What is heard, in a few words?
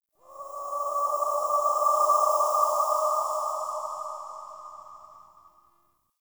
Ext Wind Death Howl; Warframe; Infested Eris